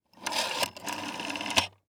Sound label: Household Phone Dial Rotary Six